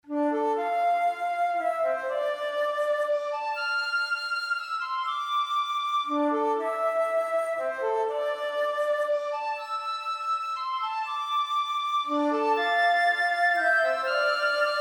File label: fluteish